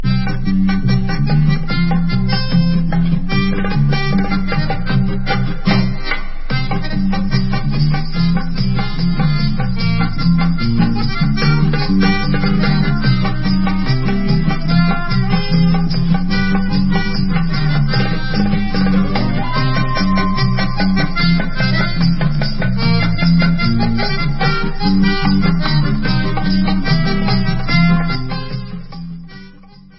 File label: instrumental